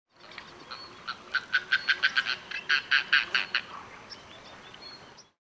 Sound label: Darter